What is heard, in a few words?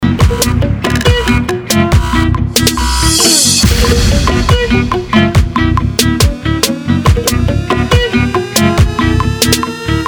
flamenco